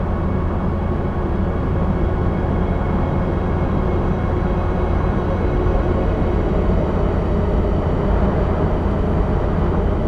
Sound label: ambient natural wormhole; Stellaris